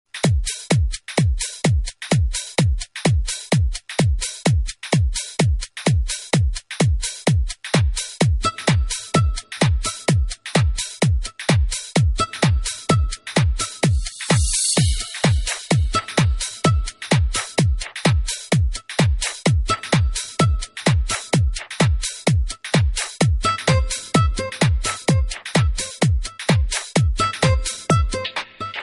Guitar Fantasy

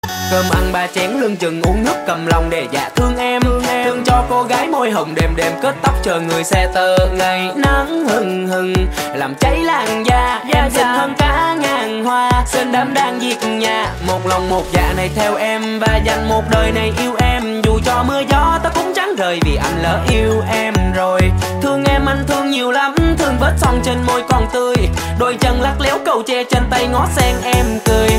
Rap

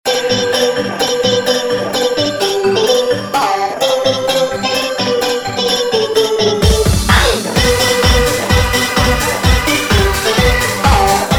choppy jingle bell; appviet

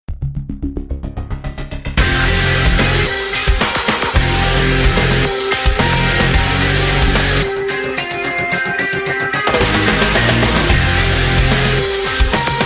nice rock guitar

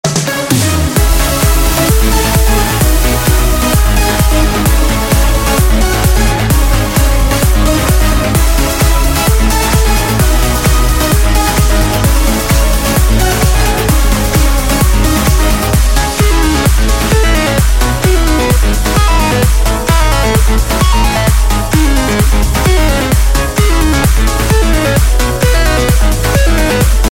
edm

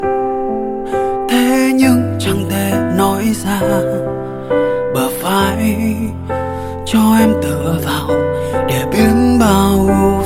Acoustic

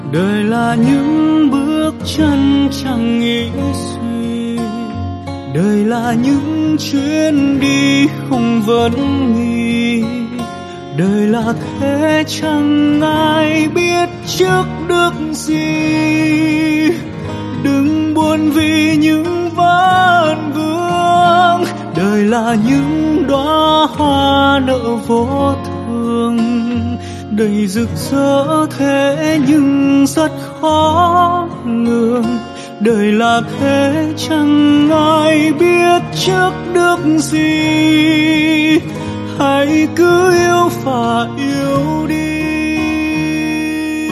Acoustic

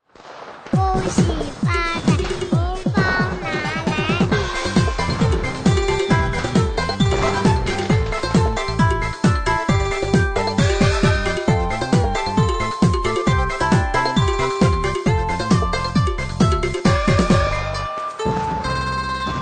chinese new year

